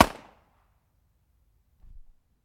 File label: revolver 45 long colt shot